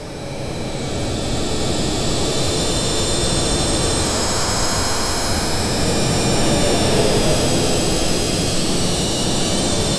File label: light space noise like a; game audio